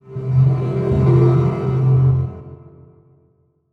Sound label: Title Transition Stab Dark Mysterious; Production Element; AdobeCC